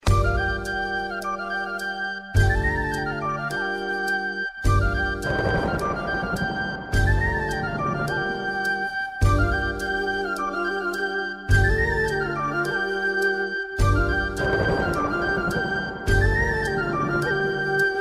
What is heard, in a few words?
Flut; Madarasapatinam